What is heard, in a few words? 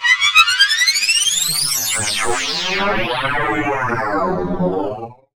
UFO Sweep; Transitional Elements; 1001 Sound Effects